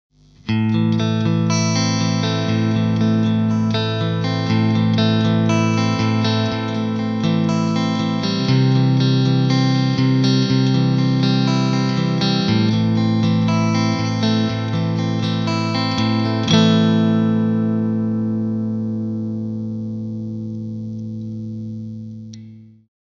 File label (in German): clean strat zwischenpos; amp; Sub-Pre